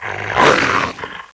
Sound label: wolf attack; Caesar III